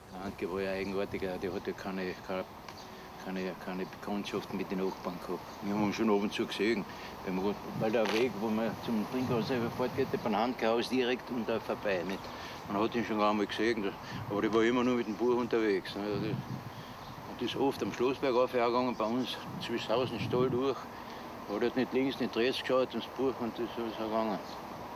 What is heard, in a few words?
bus; griffen